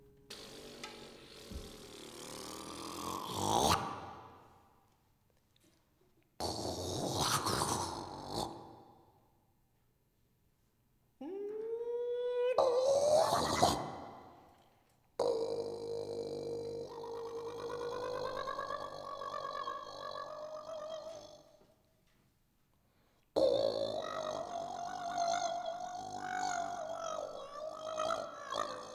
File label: Improv Interlude; sound of montreal